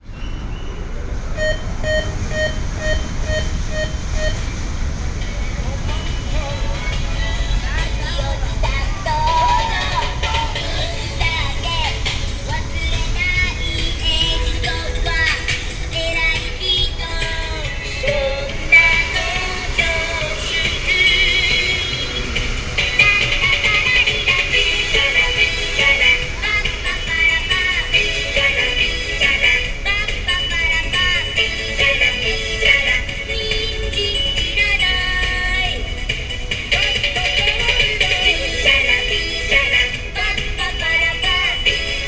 kids music; sergiyev posad; park

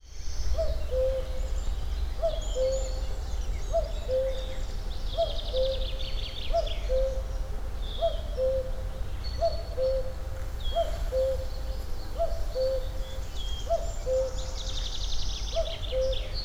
cuckoo, Abramtsevo

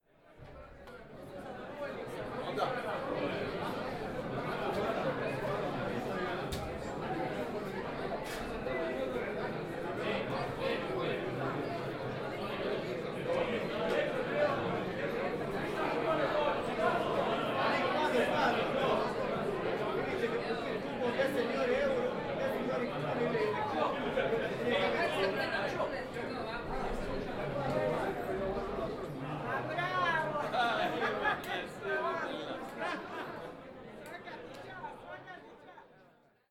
Sound Pause

